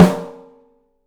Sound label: OPEN STEEL SN; 3D Drums